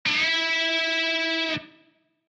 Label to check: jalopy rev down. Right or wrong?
Wrong